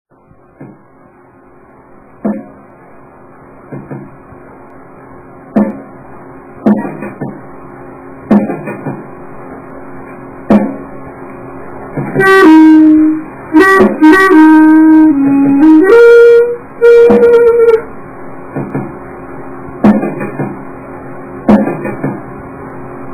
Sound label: sax soft ur